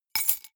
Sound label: key ring large drop stone soft